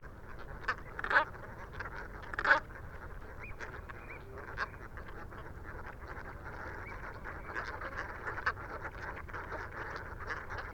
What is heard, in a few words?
Dark Bellied Brent Goose Rrrot Calls Then Flight